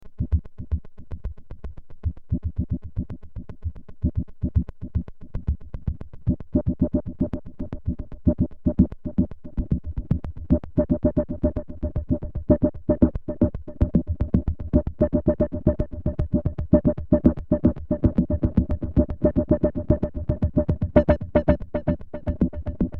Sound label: Roland SE-02 Filterfunk Lfo